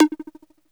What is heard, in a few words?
Pong, notifications; Wiko; Cing Peax